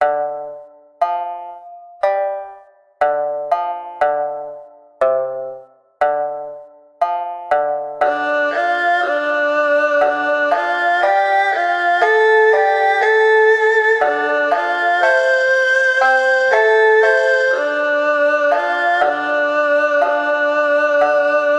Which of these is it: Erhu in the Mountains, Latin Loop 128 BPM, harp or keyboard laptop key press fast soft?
Erhu in the Mountains